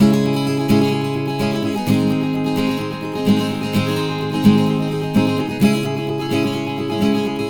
Acoustic Latin Guitar 128BPM C#min; Vengeance - Essential Clubsounds Vol.4; Guitarloops

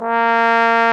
BRS BONE, Trombone; Roland L-CDX-03 Disk 2

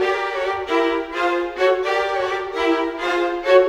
Strings; Sonic Foundry (Sony Creative Software); Orchestral Series VOL-4; Rock and Pop; Rock-Pop 16

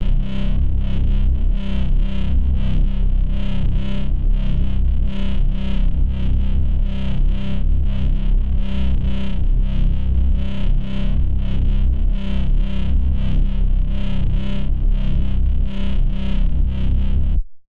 Modular, Drum & Bass; AMG - Prototype Drum & Bass; Basses

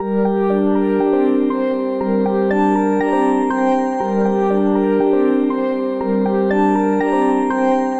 Synth, Synth Textures

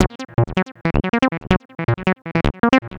Arp, Keyboards

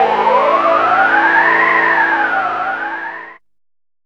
DR. LOOP, TRANCE; X-Static Goldmine 3

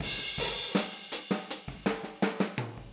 Boogaloo Fill Hi-Hat, 82 BPM, Drums; Sonic Foundry (Sony Creative Software) - Drums from the Big Room The Mixes

